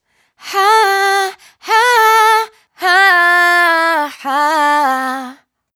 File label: riff Breathy Run Ha 105 C, Vocal, Dry Vocals; Raw Vocals; Slate Digital Omnivox Vocal Sample Pack